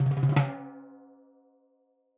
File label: timbales Low Roll; Raw Perc One Shots; Slate; SD SEISMIC Drum Sample Pack